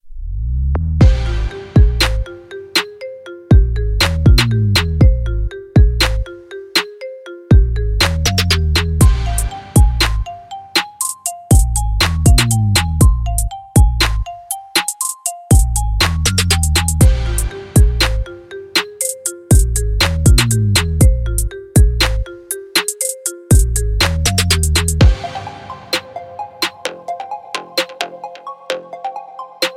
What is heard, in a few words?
Trap Drums; Fill The Trap; Midi Kit